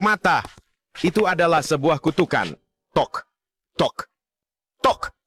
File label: loop; tok tok matha